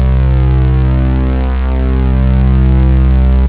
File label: juno, synth bass